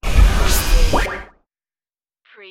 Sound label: stargate dimension suction